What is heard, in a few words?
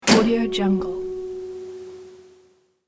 car hood slam